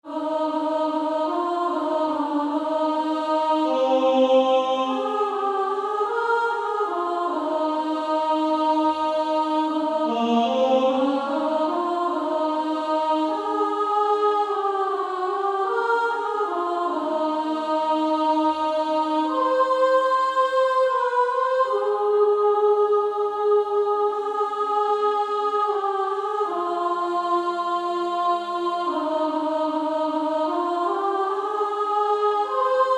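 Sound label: Female Choir, soprano 2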